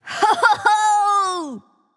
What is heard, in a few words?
yay